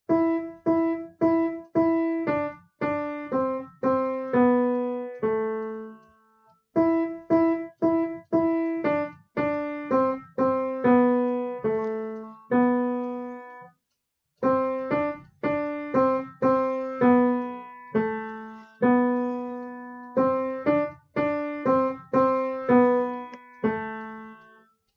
russian folk song, piano

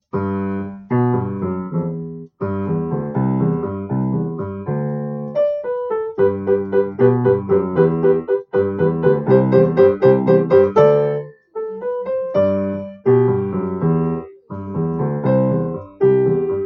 doo wop ditty, piano

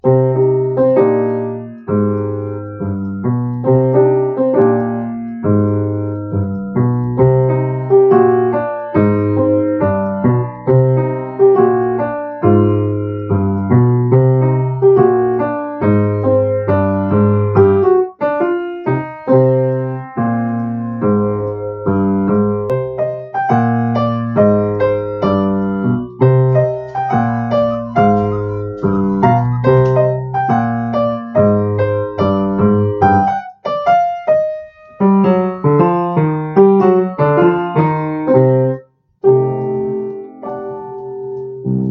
cool groove, piano

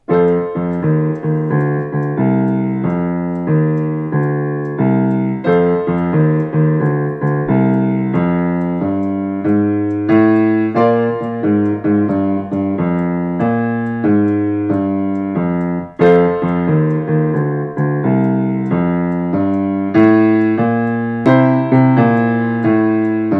cool walkin bass

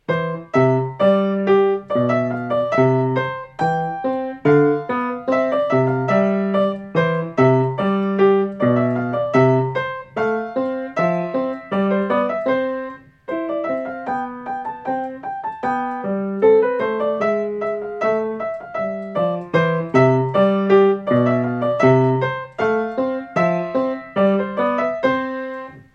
gavotte in c, piano

